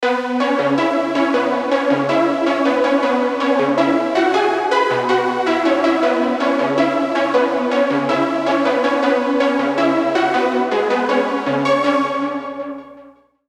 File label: C#m 80, Synthwave & Synth-Pop; MIDI Melody; Unison Synthwave & Synth-Pop MIDI Melody Collection; Raven